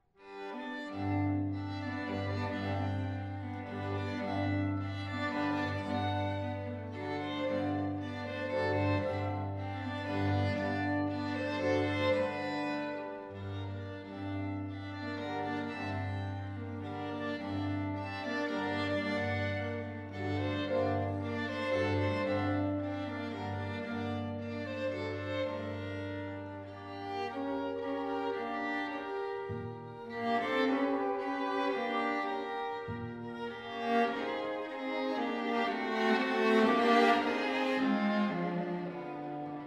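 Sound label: string trio; rontgen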